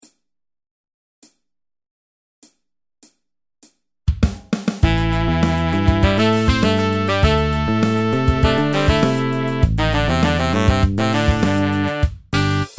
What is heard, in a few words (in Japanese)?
ブラス